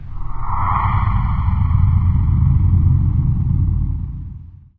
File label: city sky pass; Garry's Mod; hl2